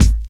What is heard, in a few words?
trebly bass drum single hit g sharp key